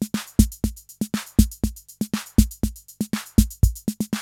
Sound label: CR-8000 LOOP, DRUM LOOPS; Syntec; Wall of Sounds; TECHNO-BOX